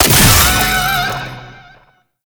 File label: Pistol Last Shot; Weapons; Warframe; Tenno; Temple Signature